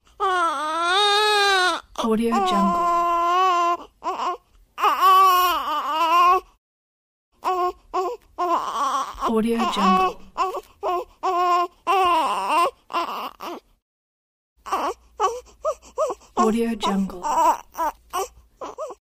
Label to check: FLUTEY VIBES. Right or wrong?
Wrong